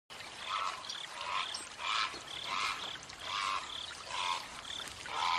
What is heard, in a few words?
Chinese egret